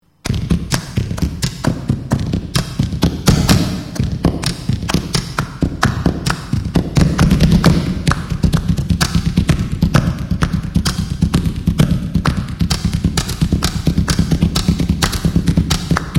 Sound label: drum